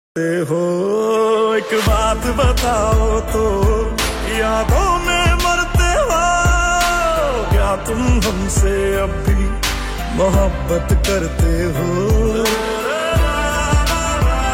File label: bollywood songs